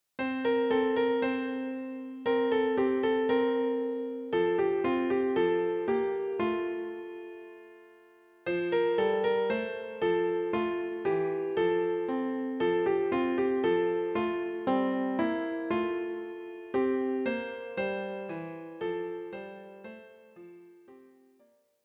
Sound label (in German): Fröhliche Weihnacht Klavier; Weihnachtslied für Klavier; mit Vorspiel; Fröhliche Weihnacht überall - für Klavier mit Aufnahme